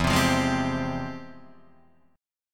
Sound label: F Minor Major 9th, E